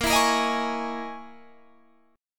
Db Minor 6th Add 9th Bb

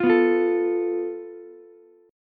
F Major Flat 5th, Ukulele; FMb5 strummed; JGuitar

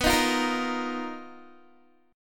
B Major 7th Flat 5th B down Guitar; BM7b5 strummed

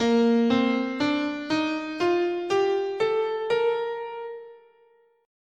Bb Ionian, scale

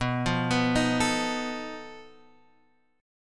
B 7th Flat 5th B Guitar Half Step Down; B7b5

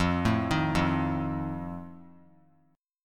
F 7th F down; F7 arpeggiated

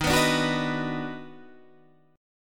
Eb Minor Major 7th Sharp 5th; strummed; chord; 11,14,12,11,12,11; JGuitar

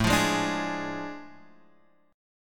A Major 7th Suspended 4th Sharp 5th Guitar Open F; strummed

